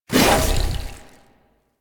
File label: Sword Board Throw, Whooshes; Weapons; Melee; Warframe; Pacifist Sword And Board